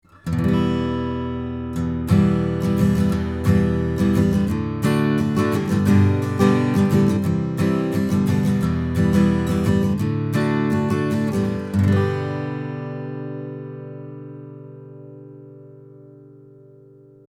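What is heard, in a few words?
Acoustic Guitar Chords, 25 cm, 15 Fret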